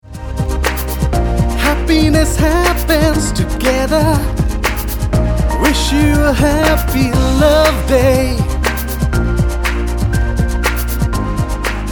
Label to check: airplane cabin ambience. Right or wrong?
Wrong